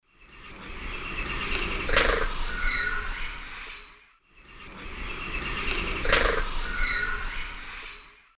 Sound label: Agamia agami, calls; S. America; Eastern Lowlands